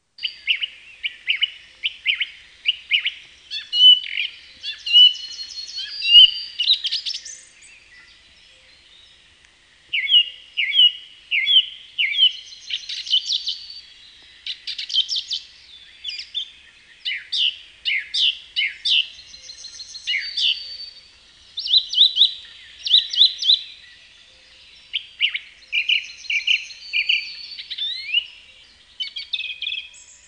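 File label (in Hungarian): Énekes rigó; Madarak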